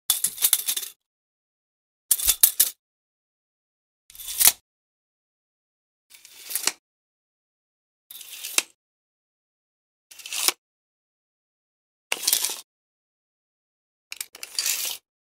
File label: Measuring Tape